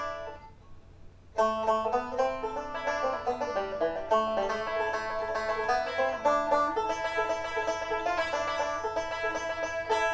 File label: a minor, banjo